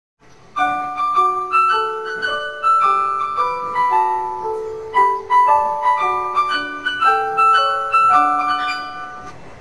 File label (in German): orgel; railway song